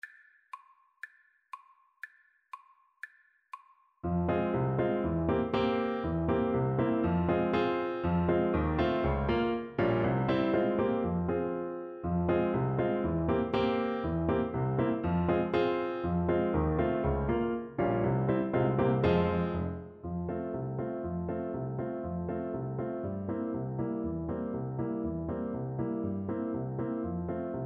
polka italienne, French Horn

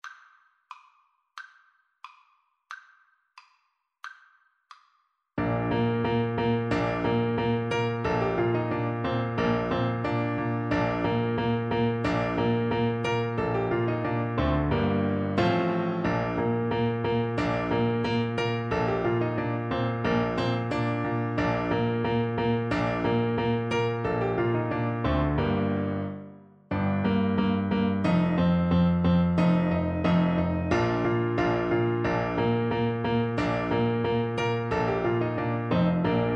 Clarinet

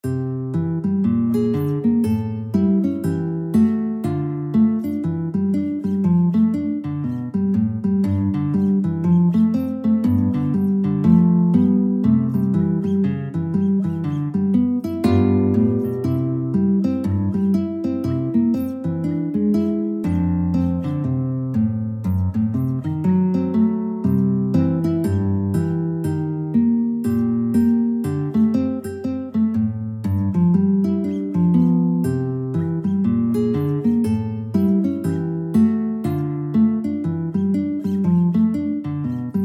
guitar

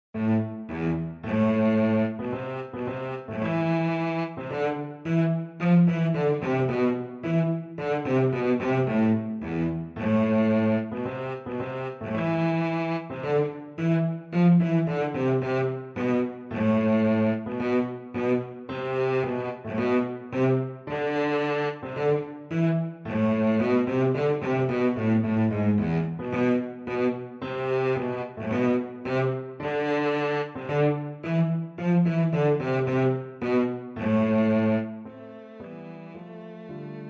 prelude, cello; L Arlesienne

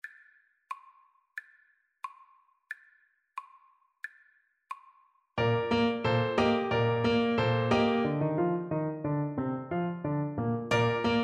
bach musette, Tuba

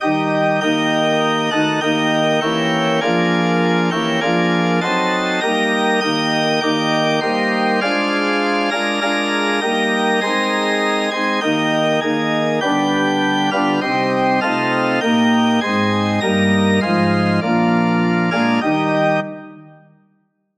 organ

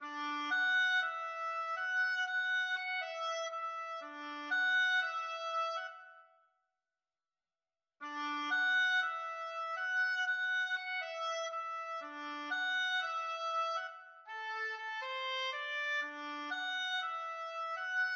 black pepper samba, oboe

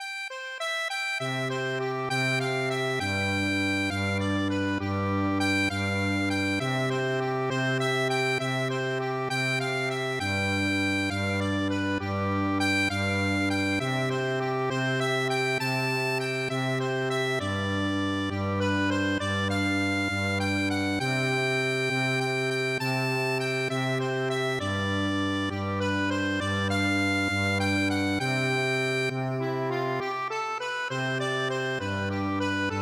chamarita, accordion

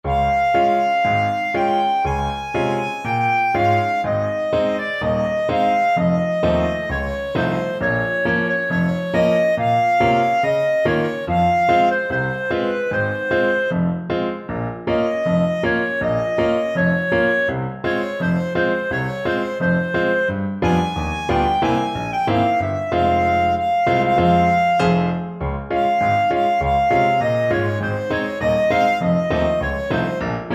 turquoise bracelets, clarinet

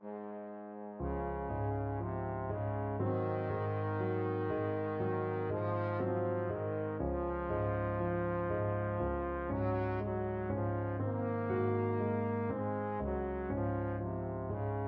trombone

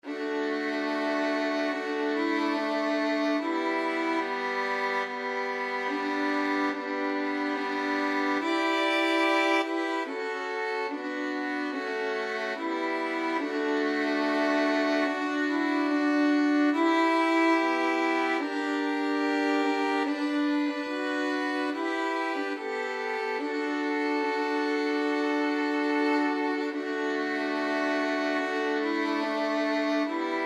3 VLN; deep river; violin trio

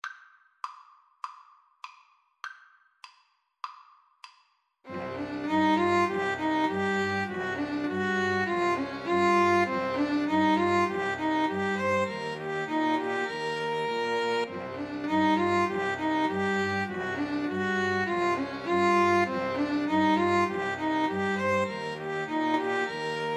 mountain king, String trio

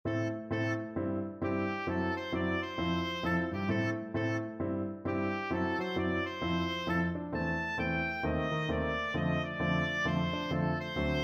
bob cat, oboe

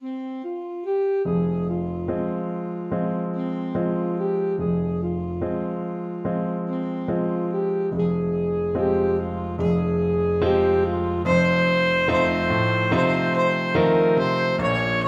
ASAX, saxophone

